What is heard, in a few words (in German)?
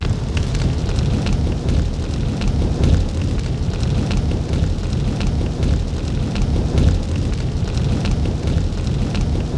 FEUER FX; Best Service; Advanced Mega Effects